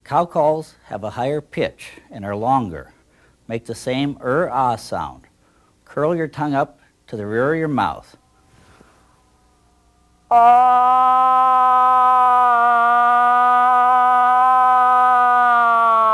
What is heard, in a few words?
cow call, moose